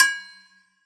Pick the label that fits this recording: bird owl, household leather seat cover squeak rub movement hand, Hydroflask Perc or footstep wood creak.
Hydroflask Perc